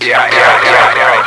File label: WARP